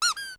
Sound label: Rubber Duck, Yamaha DD-35